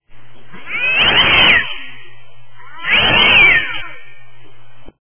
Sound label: cat